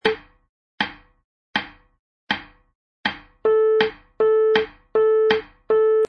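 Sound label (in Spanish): contratiempo, piano midi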